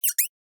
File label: squeak